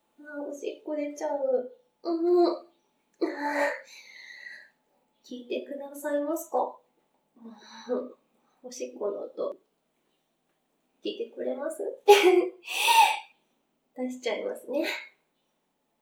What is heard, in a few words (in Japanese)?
お風呂場 洗面器 バイノーラル